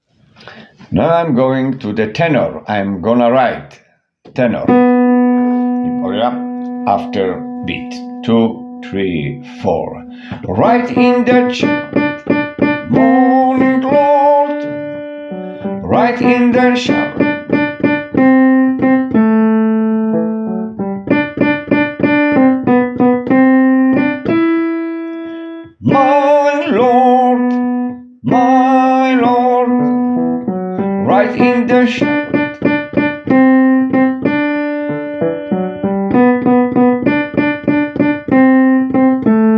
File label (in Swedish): tenor